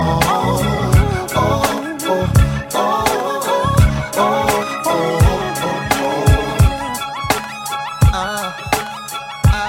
Hip Hop Msg

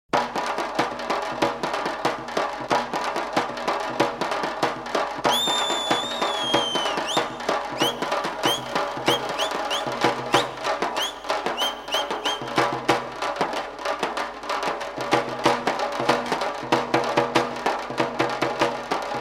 Music

